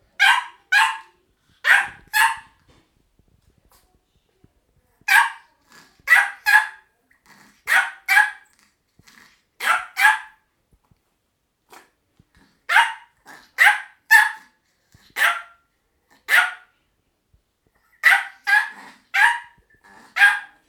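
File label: joyful dog barking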